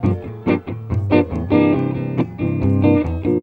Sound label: GUIT, Gipsy; Best Service; Ethnic Journey Sound Cube